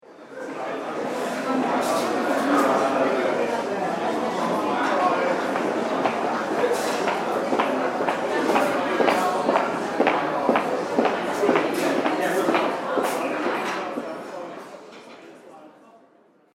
Hotel Foyer Ambience And Footsteps; Building Sound Effects; Andrew Fleming

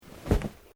Clothing Kimono Sleeve Move

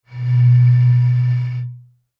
Wine Bottle Blow Musical Wind; Foley; Blastwave

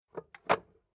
Industrial Electric Switch On Metallic; Switches; Objects

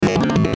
Glitch Garbled Tones; glitches; Blastwave

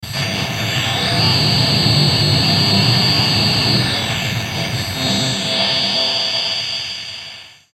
Musical High Pitched Distortion Feedback; Musical Sound Design; Blastwave

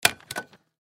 Stapler Staple Paper Rustle Press Down Click; Office; Blastwave

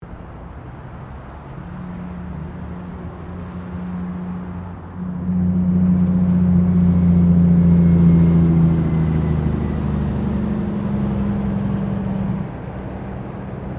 Traffic Trucks Passing By; General traffic sounds; Traffic and Cars

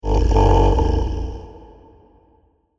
Zombie Sleepy; Horror

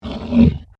Monster Grunt, Horror, Monster, Grunt, Creature; Monsters (Sound Effects:Horror); BlastWave

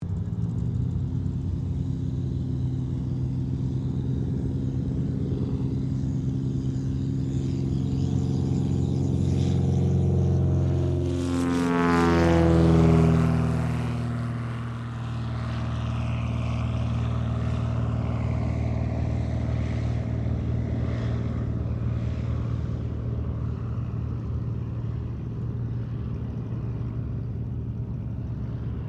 AIRCRAFT CESSNA 180 TAKEOFF; 1954; Airplanes; Vehicles

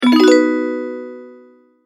multimedia tone advance

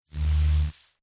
Electric Discharge, Magnetic, Science Fiction; BlastWave

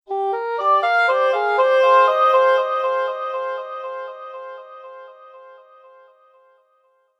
Subtle Musical Echo

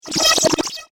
Lunar Waygate, science fiction sound effect

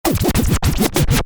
Scratch, Record, DJ; Musical

